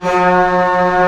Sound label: ORCH C0 PP, Orchestra; Sound On Sound; Peter Siedleczek's Orchestra